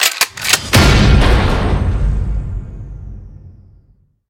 big Win End; dead or alive 2

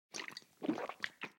beer; goldentavern

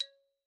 button Down; halloweenjack

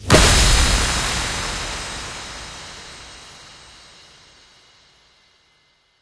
bigwin start; irish pot luck

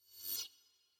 firefly; fireflies

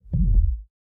explode pre animation; serengeti kings

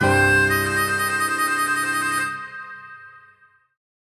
large Win; dead or alive 2

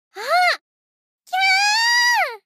gonzo Moonwalk Spin Snd; magic maid cafe

